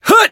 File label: attack